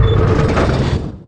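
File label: brick door; REDNECK; RAMPAGER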